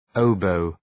oboe

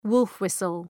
wolf whistle

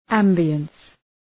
ambience

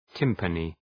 timpani

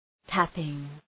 tapping

